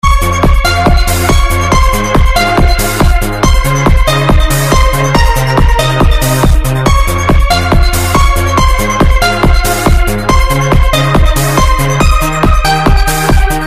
Atmosphere Trailer

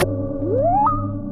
Bwoop

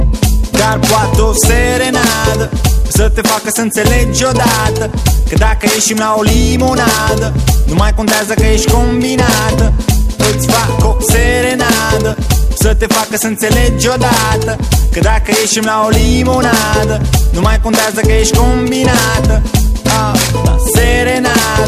Is Calling Rap, ringtones

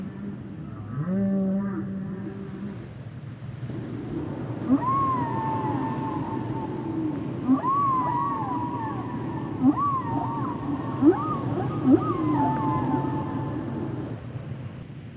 humpback whales; animals

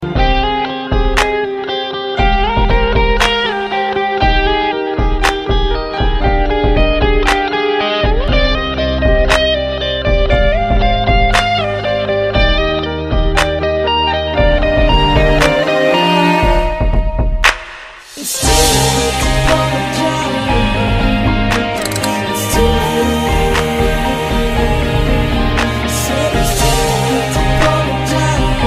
guitar, ringtone; blues

